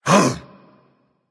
grunt jump berserker; cuchulainn

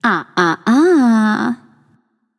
disagree, voicepack; smite; pele

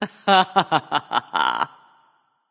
laugh; smite; voicepack; hera

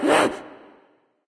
surprise; smite; voicepack; hel

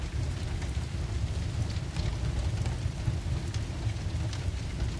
forge; smite; vulcan

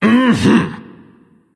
yay; smite; odin

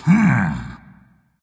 thinking dragon; fafnir

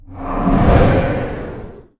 kraken summon; smite; poseidon

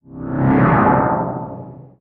kraken summon; smite; poseidon

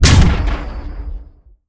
stun; smite; lancelot

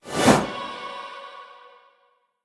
basic swing; smite

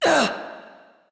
grunt hit ghost; smite; voicepack; hel

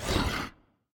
grunt; fenrir

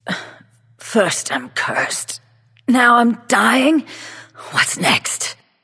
death; smite; medusa

